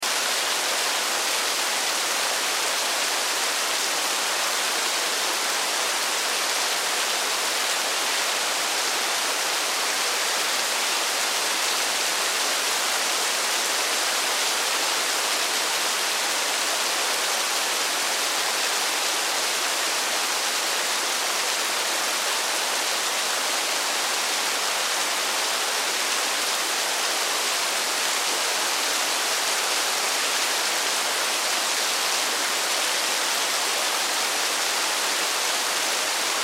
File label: Rain Forest Downpour Heavy Steady Loop; Weather; Rain Sound Effects; Part of Rain (Sound Effects:Weather); Blastwave